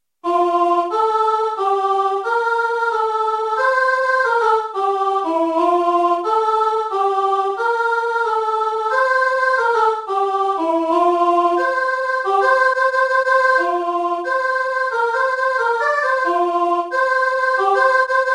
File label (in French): soprano couplet; couplet 2 soprano